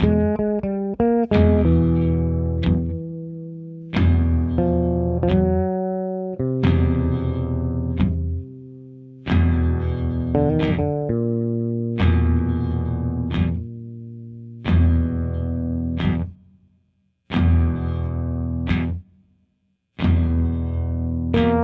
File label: Tense Slow With Nice Melody